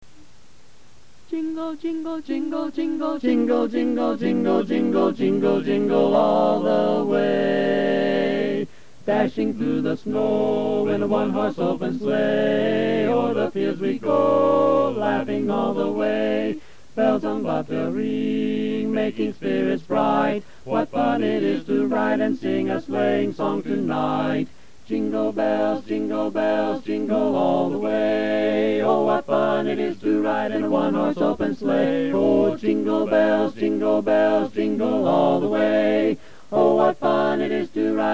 Jingle Bells Baritone; Christmas; learning tracks

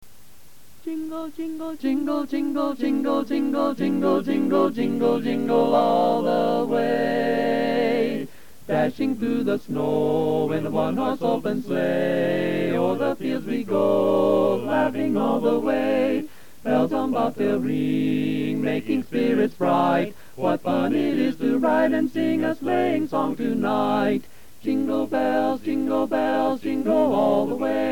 Jingle Bells Lead, learning tracks; Christmas

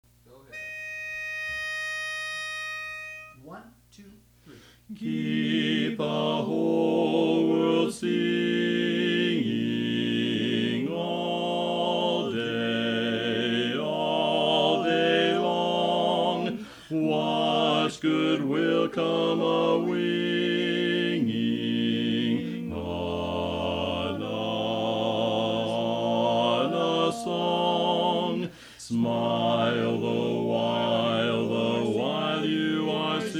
Bass; learning tracks

